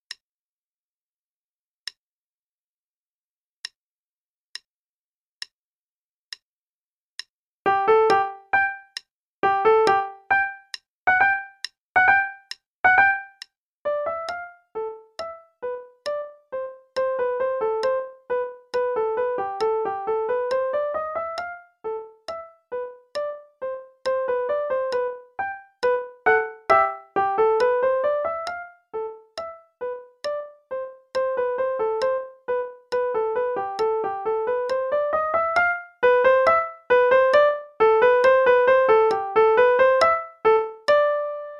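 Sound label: Play-a-long, right hand, quarter note=69; Beach; Pantalon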